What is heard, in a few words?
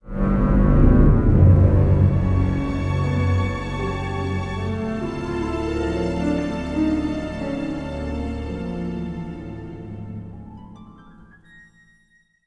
suspense, music